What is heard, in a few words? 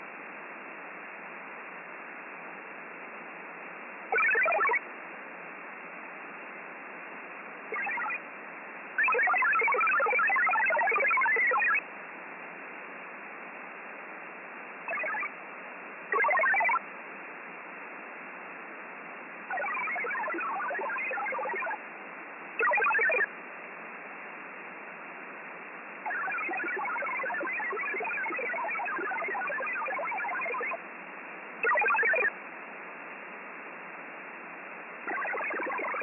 qo100 data